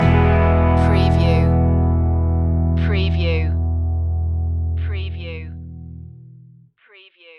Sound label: loop end